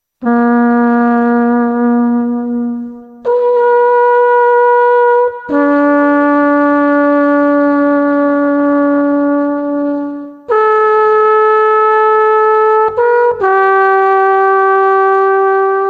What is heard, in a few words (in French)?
cor des alpes; instruments